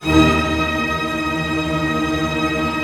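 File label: String Tremolo D#, Orchestra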